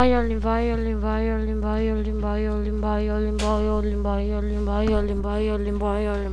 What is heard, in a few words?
violin